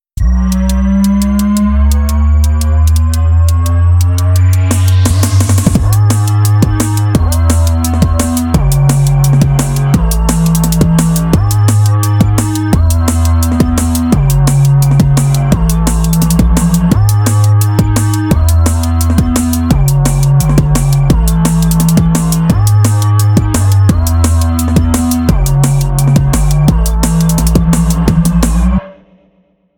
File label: dnb